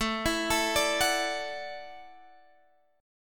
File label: E 7th Suspended 2nd Suspended 4th A, guitar